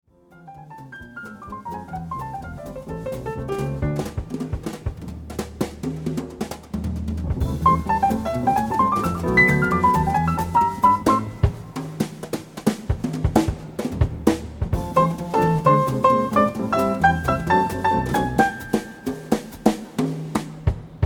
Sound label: Instrumental